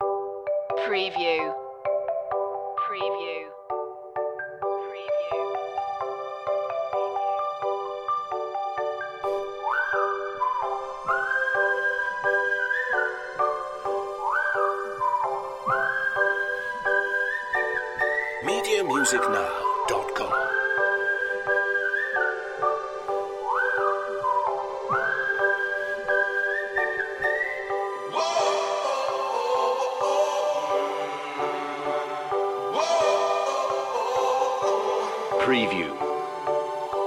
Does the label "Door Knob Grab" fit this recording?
No